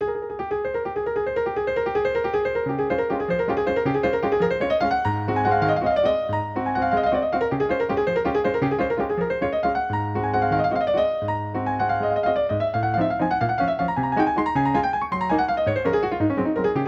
valse op.64-1 petit chien, Yamaha NP-30

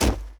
snow land; Golden Crayon Sounds

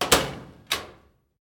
locker door open; Original TK Sounds

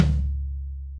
drumset f#; Golden Crayon Sounds

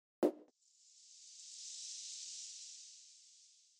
Confetti Sound Effect; iOS Sounds

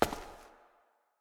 cave grounds step; Golden Crayon Sounds

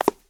marble step; Golden Crayon Sounds

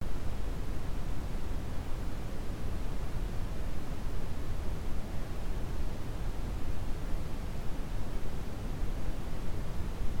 room tone; Golden Crayon Sounds

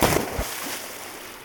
shallow land; Golden Crayon Sounds

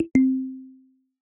Head Gestures Double Shake; iOS 26 Sounds

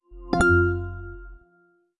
iPhone Boot Chimes Chime

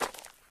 gravel step; Golden Crayon Sounds

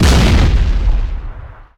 mine explode; Original TK Sounds

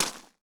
gravel step; Golden Crayon Sounds

